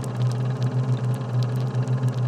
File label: Ambient Drill; Among Us